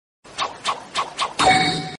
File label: hand seal sound effect; naruto